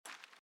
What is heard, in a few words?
armour Light Run